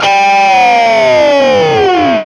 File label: GTR, E.Guitar FX; Roland LCDP02 Guitar and Bass